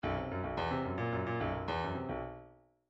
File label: midi grooves funk